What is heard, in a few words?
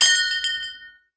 christmas bell large; sfx